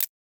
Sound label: Hihat, Dubstep